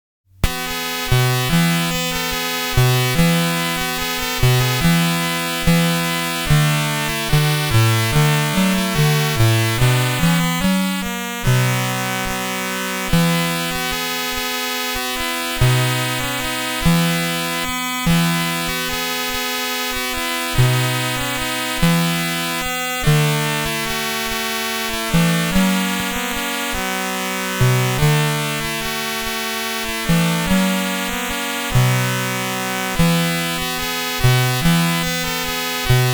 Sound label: theme